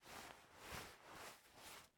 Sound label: Cry No; game audio; Mila; MiSide